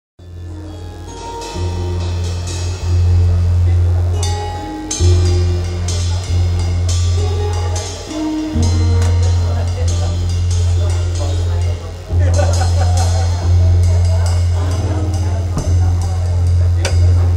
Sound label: harping